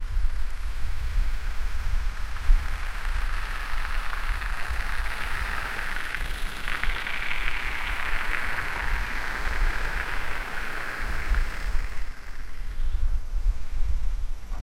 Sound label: a bicycle travelling on a